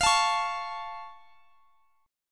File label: Db Augmented F